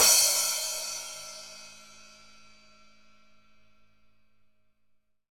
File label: Crack Butt up3; Digital Sound Factory